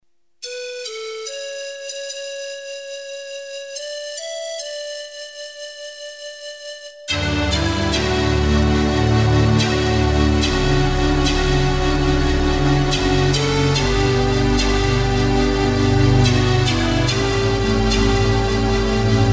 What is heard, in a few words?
instrumental; Ao que está sentado No trono e ao Cordeiro